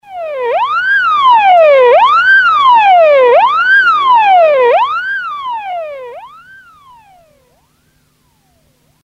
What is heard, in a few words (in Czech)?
Sanitka